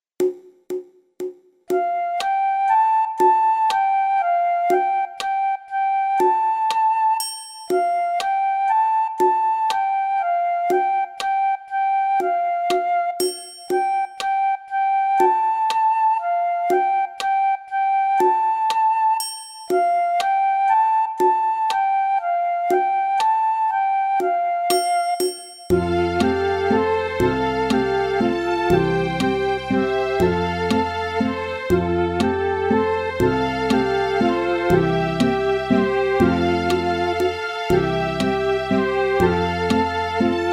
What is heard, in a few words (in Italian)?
base con melodia